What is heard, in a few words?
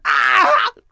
Enemy Generic Human Death Eaten